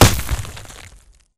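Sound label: Bullet Light Ballistic Dirt impact; Titanfall 2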